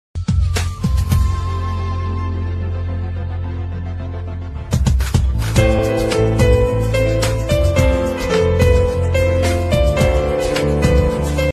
new instrumental ringtone song instrumental ringtone